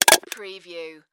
Soda Can Crinkle

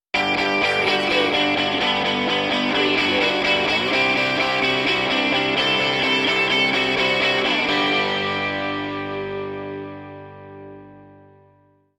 driving force stinger

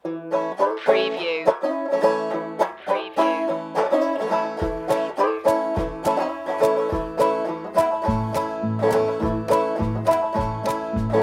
country boy breakfast loop

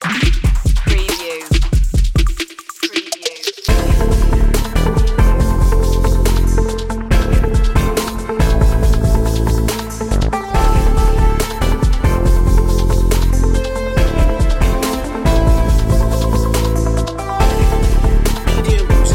urban groove

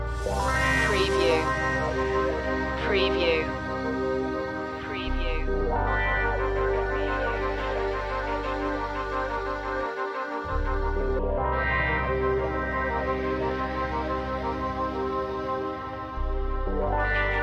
over distant shores loop

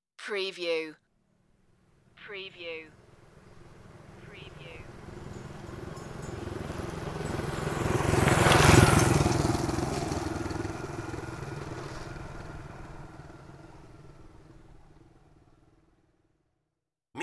rusty motorbike passing

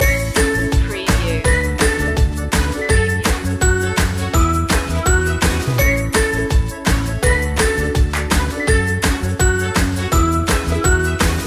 learning for life loop

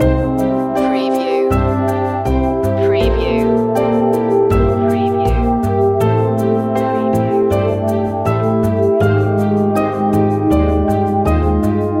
quiet love loop

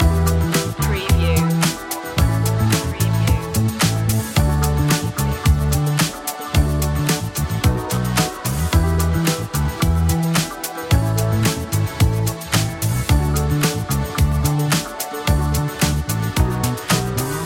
soul mate loop

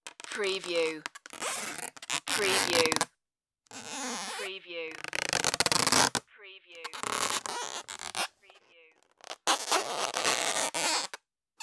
air balloon rubbing

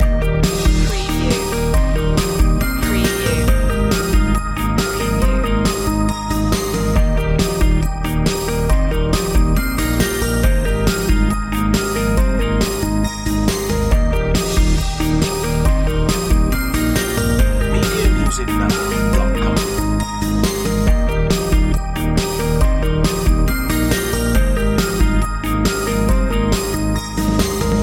electronic loop

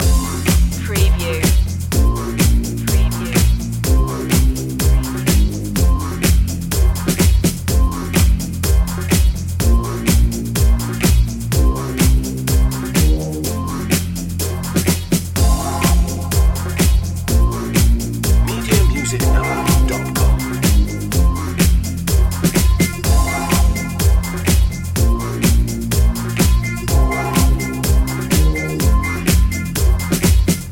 hot steps loop

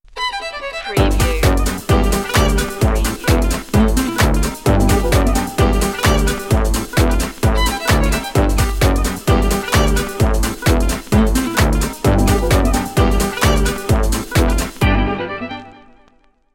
doo wop bop

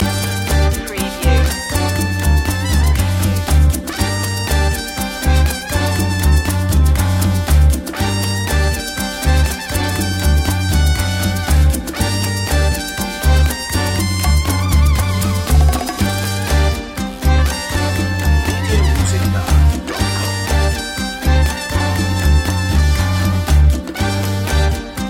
cuban heat

